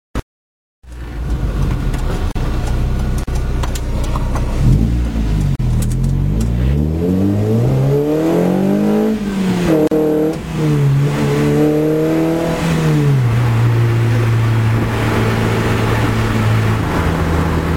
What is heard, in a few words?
RS Extreme with motobuild silenced cat